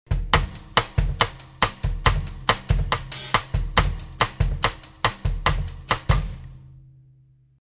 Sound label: cha-cha; Zen Art of Drumming samples; rudiment